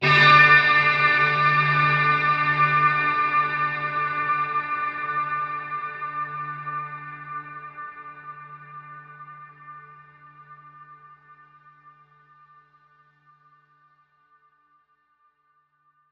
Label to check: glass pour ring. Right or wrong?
Wrong